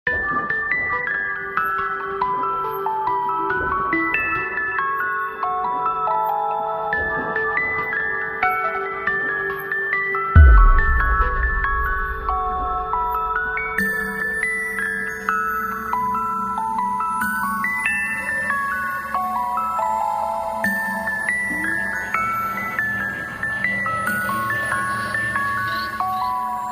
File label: Battle BGM